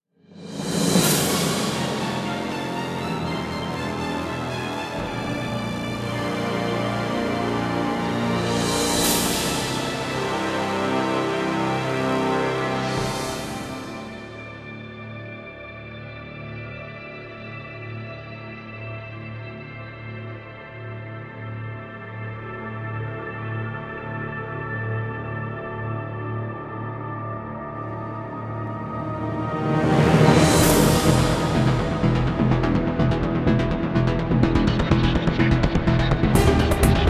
Shuttle Landing; mass effect 2 gamerip 2010

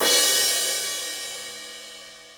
Music Mini Game Robot Arm Stinger; Brain Street

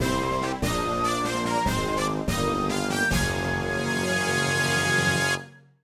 Quest Clear

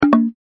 Need more coins; Secrets of Magic: The Book of Spells

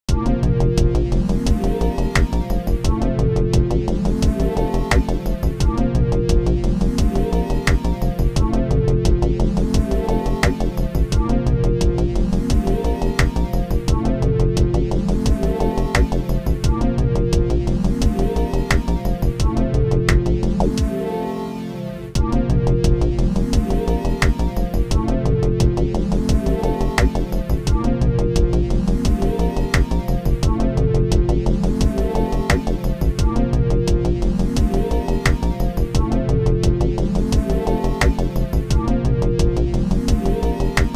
save; blokus portable steambot championship; psp

